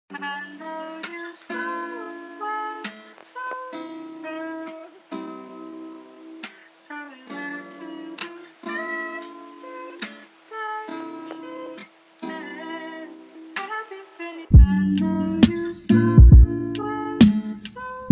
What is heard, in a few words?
Instrumental